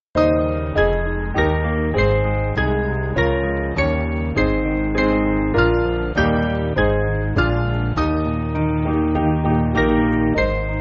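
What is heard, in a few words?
Piano, Music Backing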